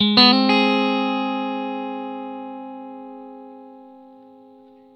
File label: blues guitar riff 120, Vintage Blues Guitar; USB Soundscan vol.22; AKAI